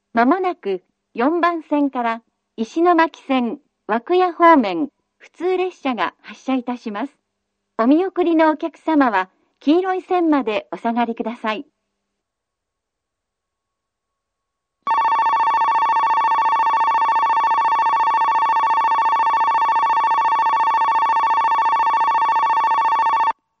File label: kogota 3 bannsenn